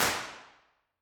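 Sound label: council chamber impulse response, York Guildhall council chamber; b-format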